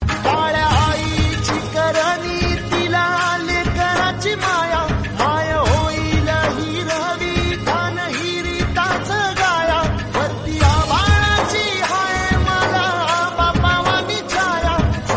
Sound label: Marathi Ringtone